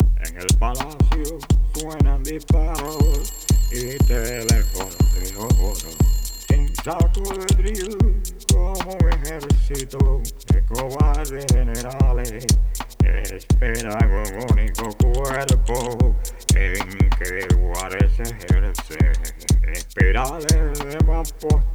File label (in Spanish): Fuga Disco mix